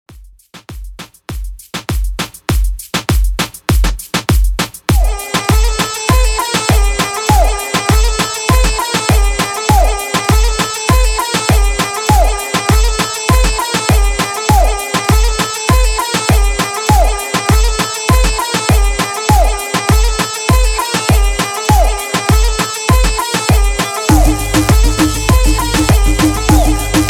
MOOMBAHTON 100 BPM

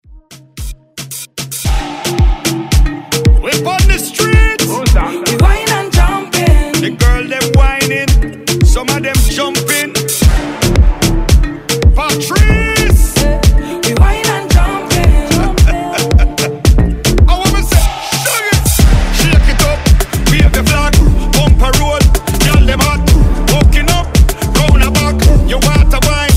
Moombahton Redrum

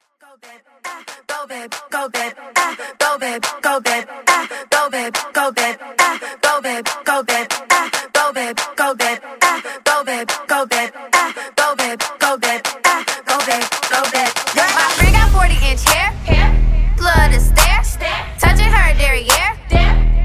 Acapella Clap Starter

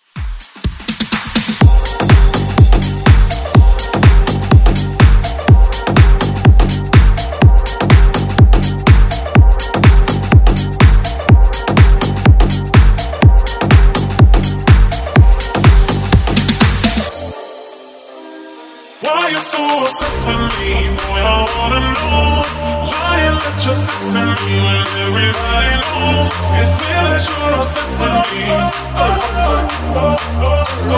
Pop Dance Redrum

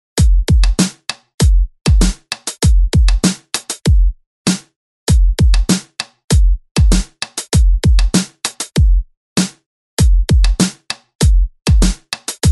98Bpm; Redrum

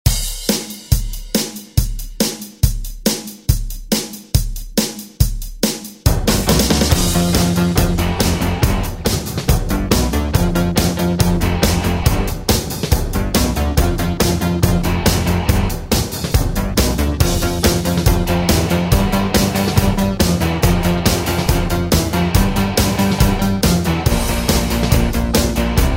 80s ReDrum; DJ Mhark

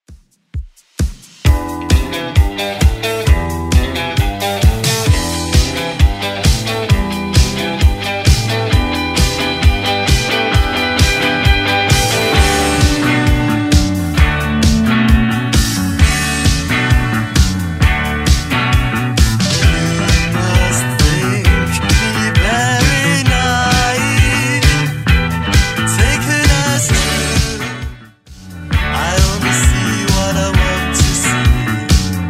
80s Redrum 132bpm

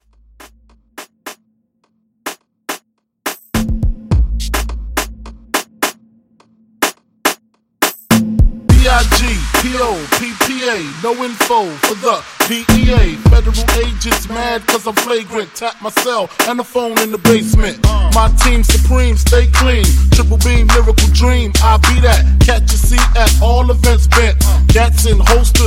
Mashup; Remixfreakz

